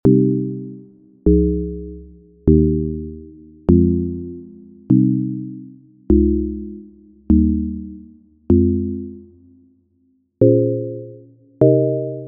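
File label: CHORD PROGRESSIONS Latin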